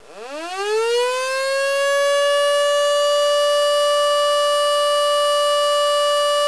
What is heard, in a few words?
Tornado All Clear Alarm; Chicago